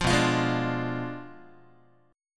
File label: B Diminished 7th B down Guitar; Bdim7 strummed; 8,7,8,6,6,x